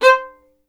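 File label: STR violin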